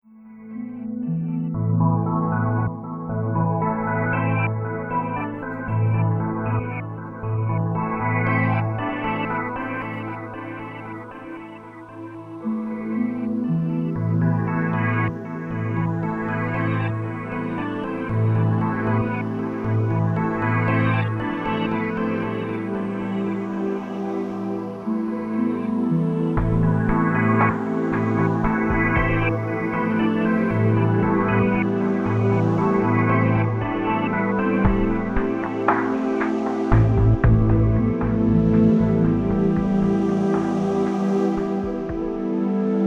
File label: organovitch; Atmosphere